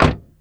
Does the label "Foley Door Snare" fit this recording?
Yes